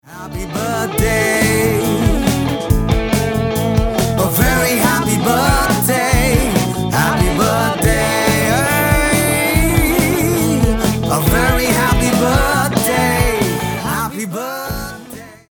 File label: HAPPY BIRTHDAY TUNE ENGLISH MALE